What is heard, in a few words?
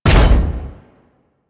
Jump Landing; Warframe; Corpus; Arachnoid Hunger; Steps